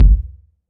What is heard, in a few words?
small reverb steel kick drum sound G key